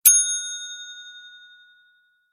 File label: correct